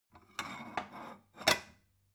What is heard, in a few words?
stone bowl shelve